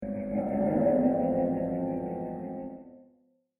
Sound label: Zombie Space Sheep; Zomby Sound Effects; science fiction sound effect; Andrew Fleming